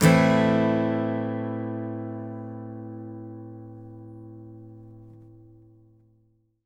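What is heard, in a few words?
Acoustic guitar A minor down, Country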